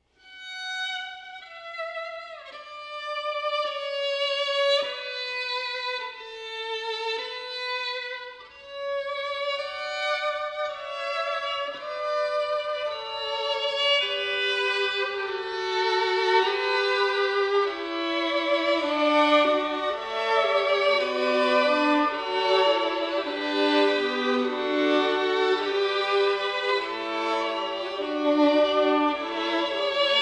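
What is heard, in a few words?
Pachelbel Canon in D, violin; Daisy Jopling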